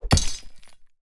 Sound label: sword attack